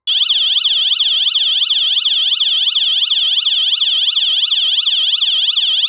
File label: Alarm